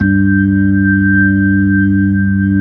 B-3, Brite; Keyboards of The 60's and 70's

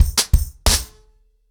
90BPM, ACOUSTIC DRUMS

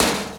TEK BALL, INDUSTRIAL; Roland - LA Composer vol. 1

